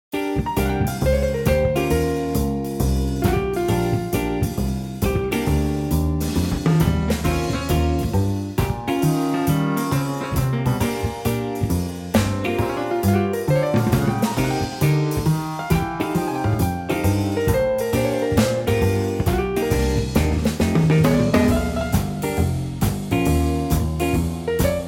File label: F Minor Blues